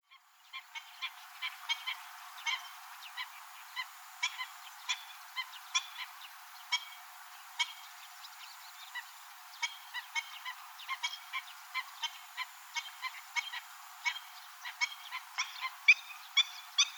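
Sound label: Black-winged Stilt, hyogo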